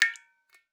Drips

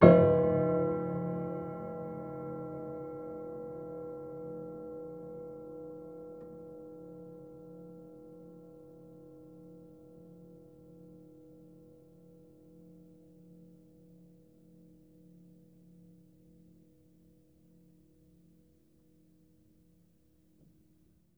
Harm Ped Low, Piano Harmonics

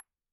Bottle Struck

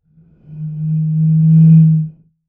Bottle Blown

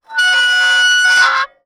Soldering Iron Stand Drag

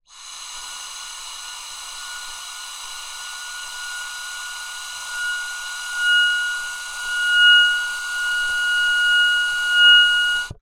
Wind, Melodica

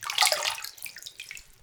Dribble, Water Dribble

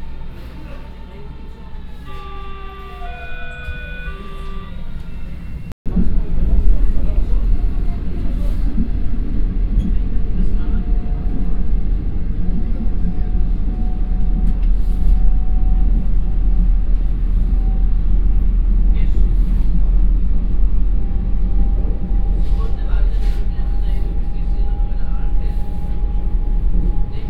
S Bahn Berlin; Sound Scapes

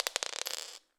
Drop On Floor, Medium Wine Glass

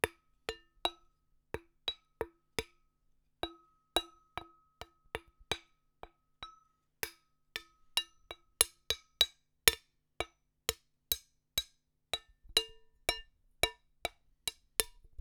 Drum Stick Damped Montage Hits, Large Wine Glass

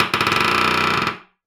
Door Creak

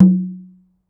Kendang, Gamelan

